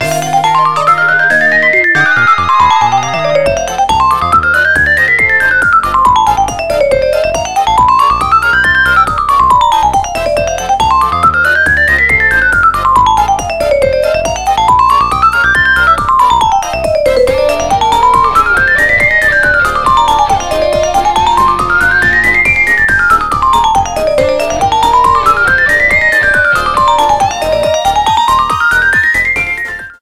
Music Park Glockenspiel

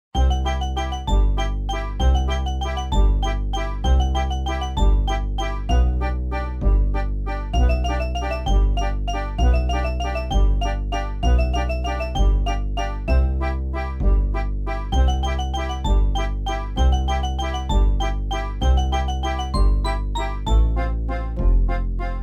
Music Lakitu Launch

